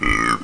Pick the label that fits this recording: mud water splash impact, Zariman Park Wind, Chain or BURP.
BURP